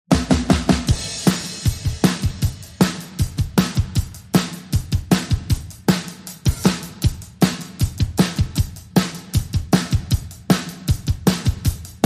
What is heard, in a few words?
Drums